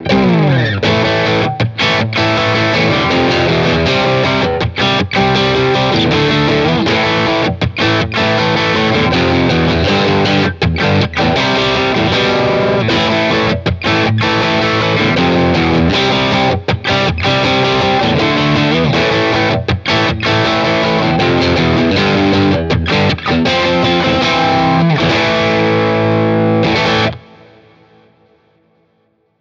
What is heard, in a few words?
Tele 212 V30 M25